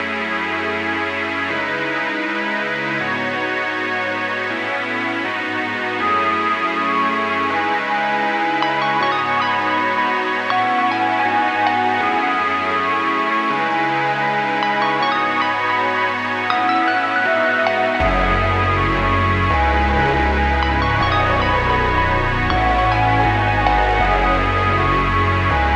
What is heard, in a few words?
160 BPM C# Min, Wavy; Cyberpunk; Cymatics; Destiny Premium Melodies (BETA)